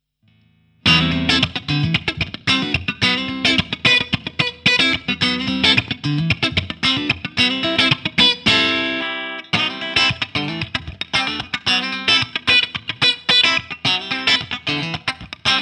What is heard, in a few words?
Joyo Bantamp Zombie II Clean